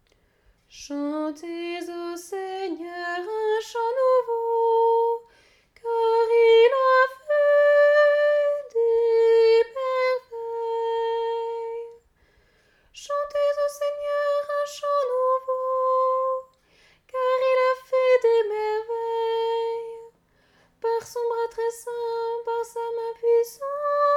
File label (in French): Psaume soprane; psaume 97; Antienne pour la messe du Jour de Noël; R/ La terre tout entière a vu / le salut que Dieu nous donne.